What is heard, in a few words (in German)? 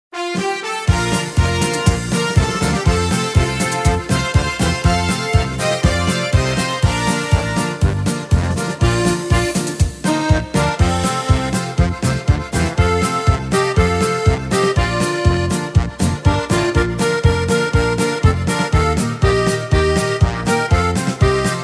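Pfaelzer Stimmungs Medley; bonmidi music; xg Demo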